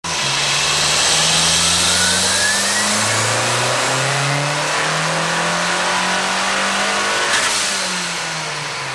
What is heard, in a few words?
daytona front, dyno